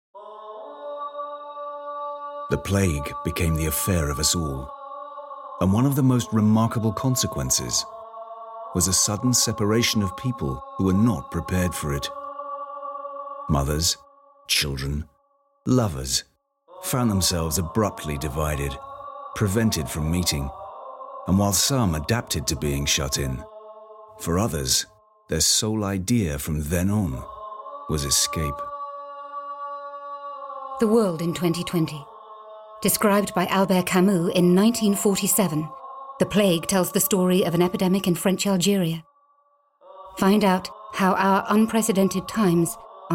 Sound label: RADIO MIX CALL TO PRAYER MUSIC